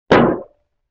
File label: Bass Drum Rim Shot; Vintage Cartoon; Drums & Percussion